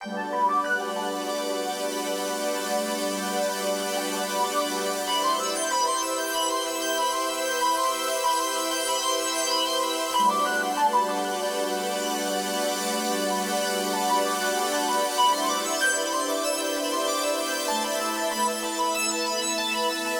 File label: sparkle pad A, Smooth RnB, Emin